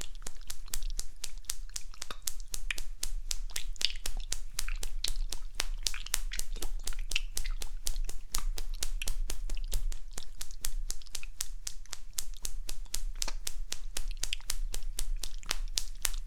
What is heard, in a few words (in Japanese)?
ピストン音（フィニッシュ前)